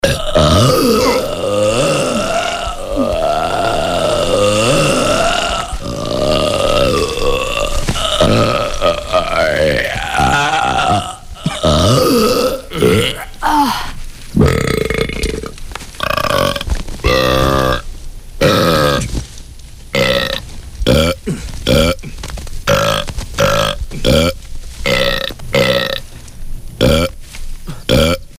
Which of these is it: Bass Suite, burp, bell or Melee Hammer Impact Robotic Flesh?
burp